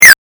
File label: game effect